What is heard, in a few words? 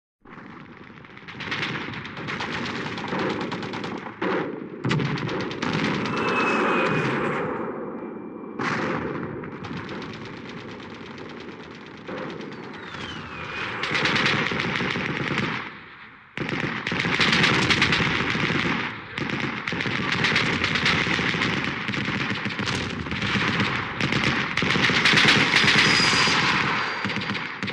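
war, shooting, bombs from planes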